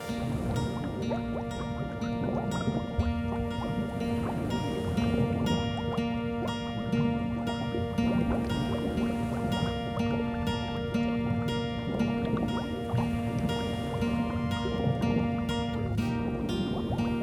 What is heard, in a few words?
Underwater